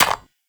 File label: Bird Three Single Step; Warframe; Entrati